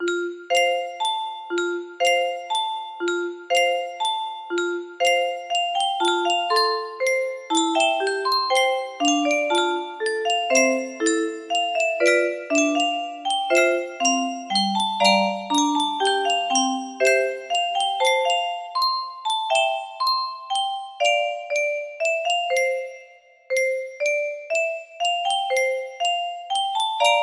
melody, music box

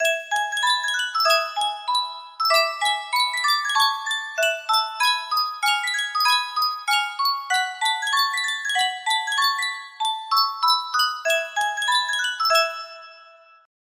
superstar music box

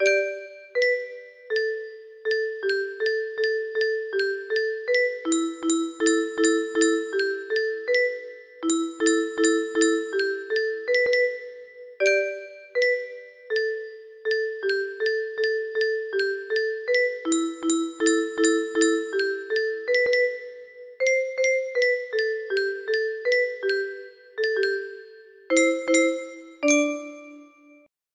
melody

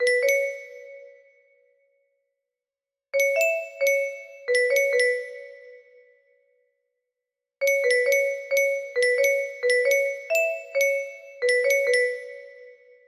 chorus, music box melody

